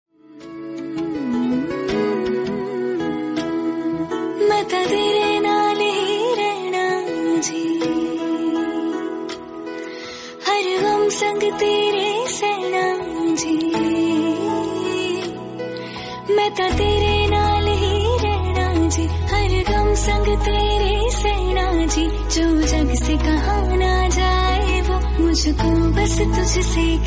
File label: happy birthday hubby ringtone